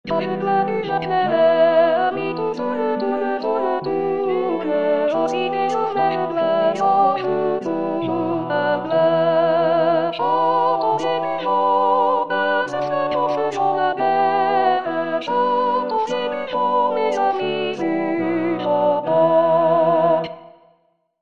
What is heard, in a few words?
tourdion